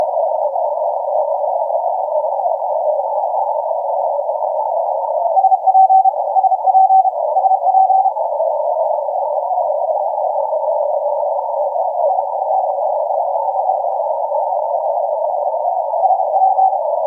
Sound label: 40m CW